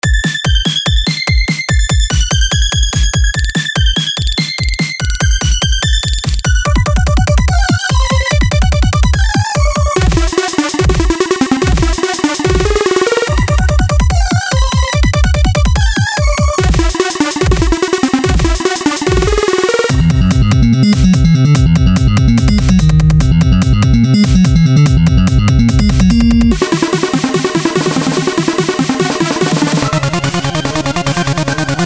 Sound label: Noise